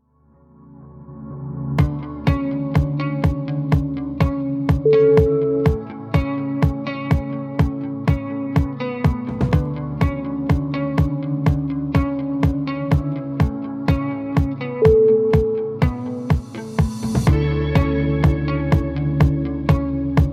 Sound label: guitar indie